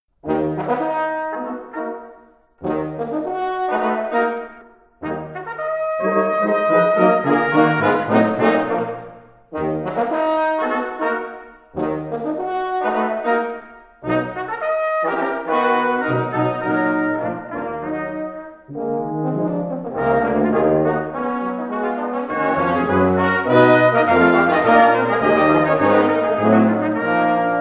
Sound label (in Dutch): Quintet Variatie; Ewald; Quintet 2 opus 6